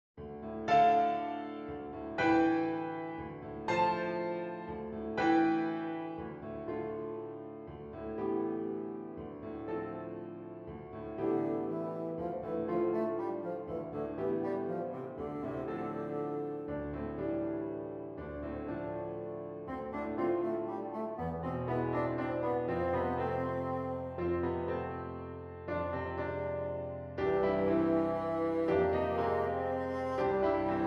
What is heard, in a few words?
bassoon